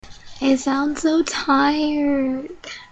tired

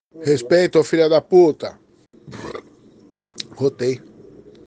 burp

